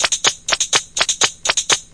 124 bpm bleepy hats

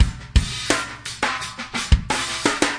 funki compression, drum loops and breaks, 086 bpm

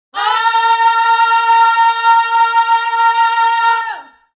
low voices male, Male Oh

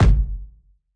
kick

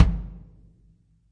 real kick; A Real Freakin Drum Kit

